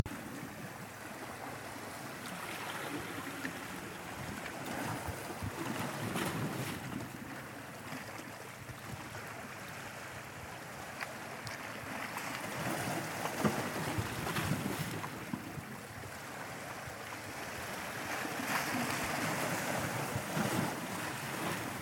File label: ocean waves